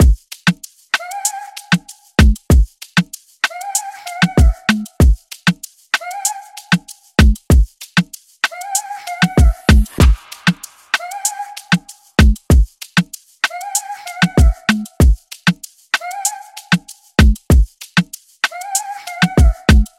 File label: 96 bpm modern afrobeat drumloop; amoin